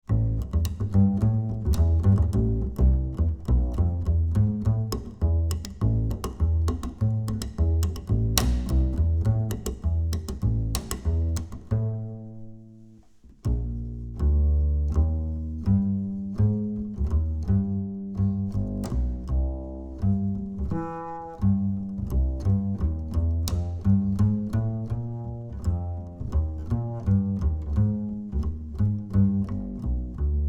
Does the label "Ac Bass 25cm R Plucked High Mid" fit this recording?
Yes